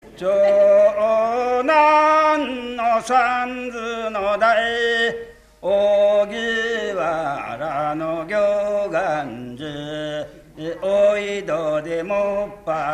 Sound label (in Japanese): kuyou uta, minyou